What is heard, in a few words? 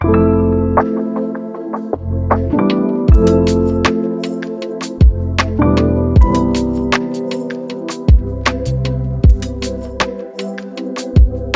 relaxing chillhop loop